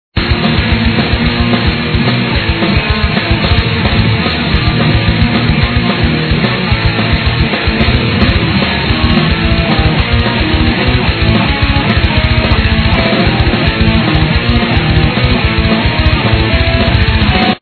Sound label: Menu Loop; Speeding Rampage